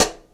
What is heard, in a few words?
Vintage Closed Hi-Hat; Drum n Bass; Hi-Hat One-Shots; KJ Sawka; Drum n Bass & Beyond; Drum Tool Box